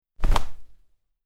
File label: Cloth Character Movement Foley Whoosh